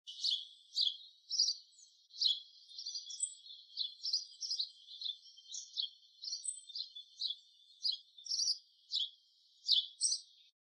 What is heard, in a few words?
sparrow house